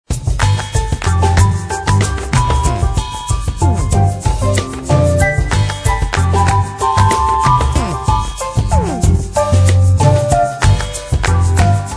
Level Music Beach

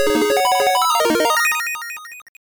Item Obtained; Sylvan Tale; Game Gear

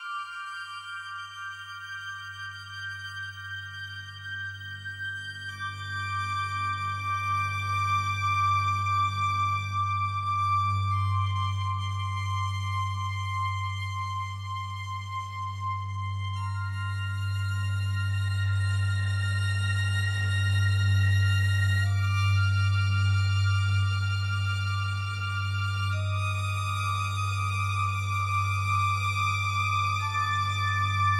loop Sad; the walking dead michonne 1 in too deep

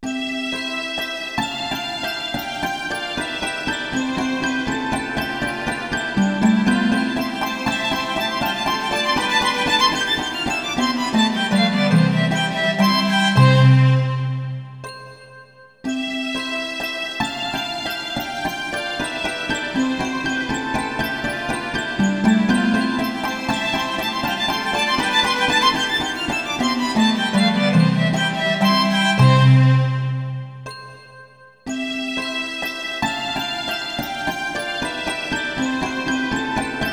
Game Over; Punky Skunk; Kuri Skunk; psx

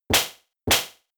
Slap; Double Slap 1hit

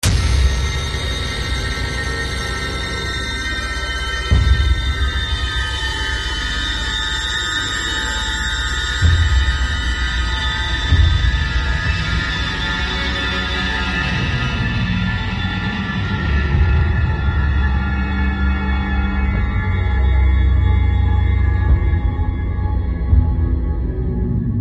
Linear - Shock

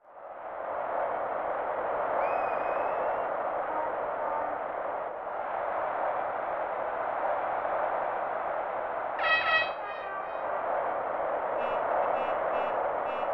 In-Game Ambience; Ken Griffey Jr. Winning Run; SNES

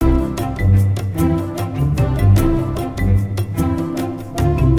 Tortoise start; LEGO Universe

